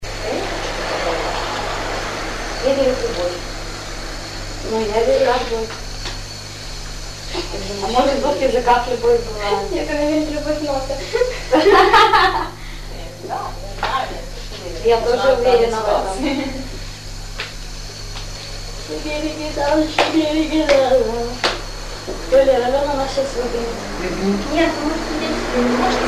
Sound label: talking in hotel plus motocar; greece; общение одесситочек; Эгейского моря